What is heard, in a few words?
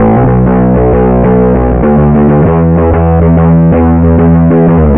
bass, Loops Phonky